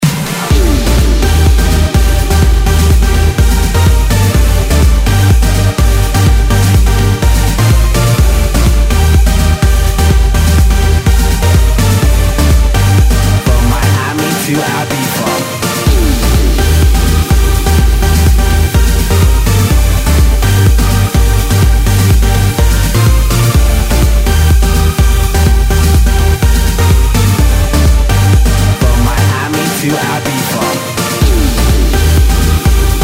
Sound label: Melody, RingTune